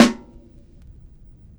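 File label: Vinyl Snare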